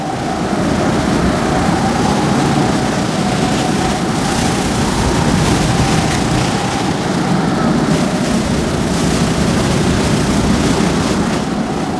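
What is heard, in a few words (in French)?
tempet, storm; MOBICLIC 13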